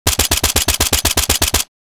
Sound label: MK2 assault rifle short burst; war; gfxsounds